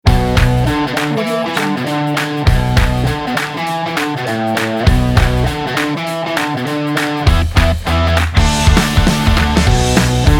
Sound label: upbeat commercial rock